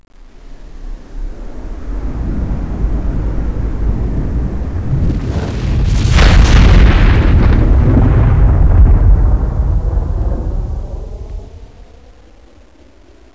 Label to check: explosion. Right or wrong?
Right